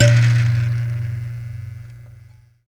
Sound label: BUZZ, Buzz Kalimba; Roland LCDP11 Africa VOL-1